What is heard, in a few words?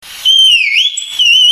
mouth